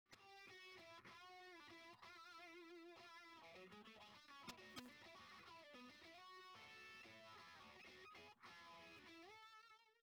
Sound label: mxr phase90, guitar effector; guitar effector variation